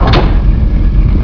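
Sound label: door move; svencoop; quake1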